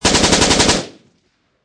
Machine Gun Sig Sg 551 Long Burst Close Perspective; Lynne Music